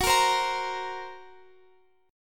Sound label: F sharp Major Flat 5th F sharp Guitar Open F; F#Mb5 strummed; x,x,18,17,15,17